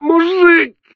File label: death, Human